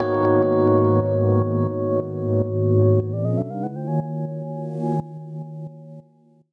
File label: Chords Tones; Equipped Music; Loungin House